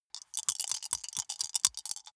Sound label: small four rattle in hand closed